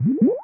Bubble; Buggy; PS1

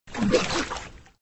Drown; Extra Lives

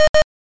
Wrong Way

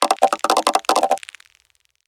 Crab Scream; we are the dwarves gamerip 2016

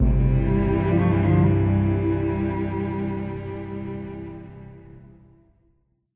Music Autumn Amb Day Stinger

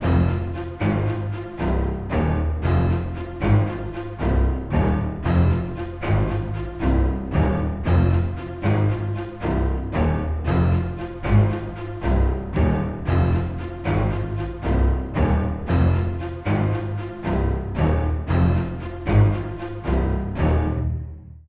String; The Bureau XCOM Declassified

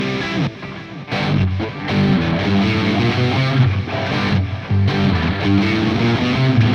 Music Transition Guitar; GnomeGnight

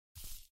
run

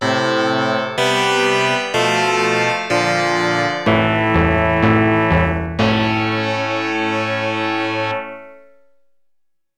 Pattern Clear 2; Konami; A-Jax; Gaisen 2

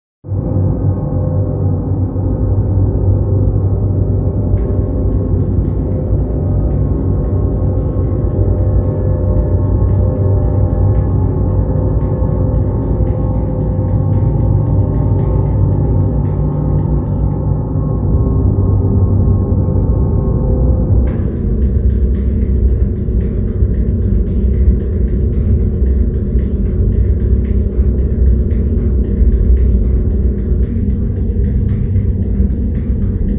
Ambiance; From Dusk Till Dawn

